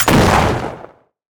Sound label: Shotgun Shot